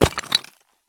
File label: landing tile; Escape from Tarkov